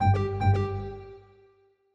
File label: Hardware Fail; Longhorn Valley Sound Scheme by David Mounder